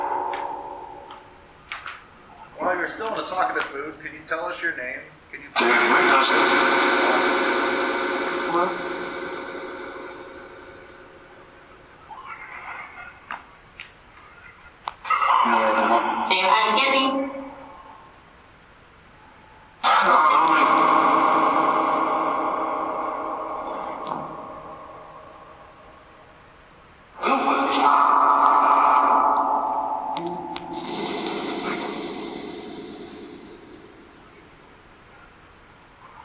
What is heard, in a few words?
Auditorium Phasma Box